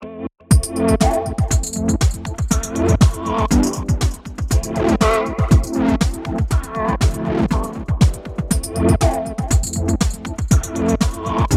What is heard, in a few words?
Club Electric Cinemaric House Ambient Dance Edm Music; New York